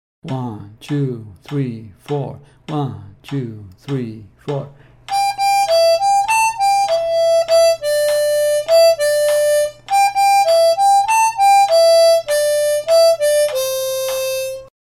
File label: 100 bpm, harmonica; Camptown Races